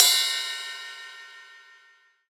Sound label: big room ride one shot B key